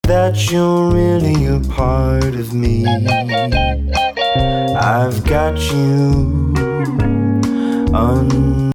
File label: Fill